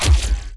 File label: fire laser short